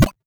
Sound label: Blip, 8-Bit